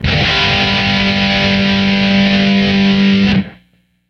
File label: Guitar Power; Back to the Future The Game; Android iOS PS3 Wii Windows Xbox 360 gamerip 2010